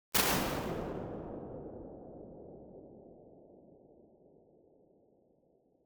DAMPED CAVE, Impulse Response

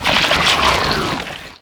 Plant Giant Vines Grow; SFX Kit; Foley - Misc

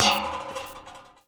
Street Ball Metal Pole Hit; SFX Kit

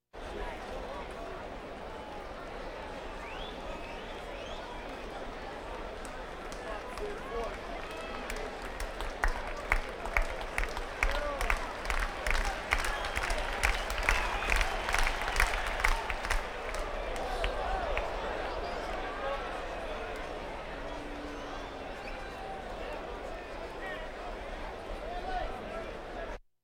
Crowds Race cars Baseball; Valentino Production Sound Effects Library

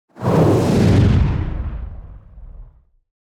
Fire Whoosh Speedy; Hollywood Edge; sound designer's toolkit v2

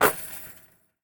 Street Ball Chain Swish

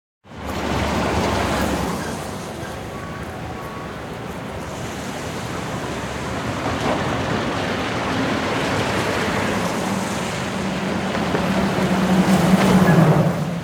Ambience Traffic City Medium Midday City Traffic; Blind Drive

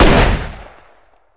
Gun Pistol Shot; SFX Kit; Weapons

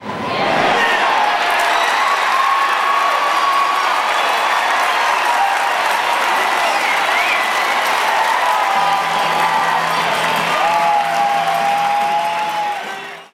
Baseball Crowd Cheer; SFX Kit; Crowds

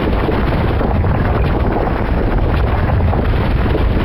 Quake Tunnel Loop; Destruction

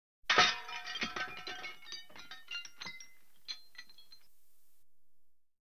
Audience Applause

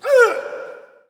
Hockey Male Hurt; SFX Kit; Hockey Vocal Sounds

